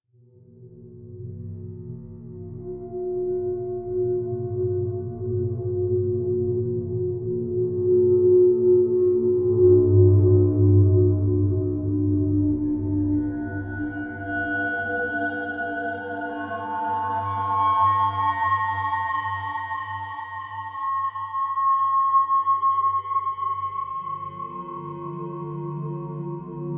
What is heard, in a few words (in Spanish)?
musica ambiental terror suspenso miedo instrumental